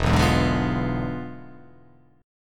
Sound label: E 7th Flat 9th, down